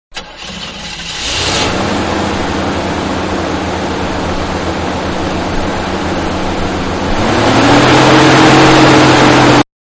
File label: Start Up Accelerate Exterior, Van; Cars; Vehicles; Manhattan Production Music